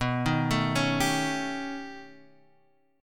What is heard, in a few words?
B 7th Flat 13th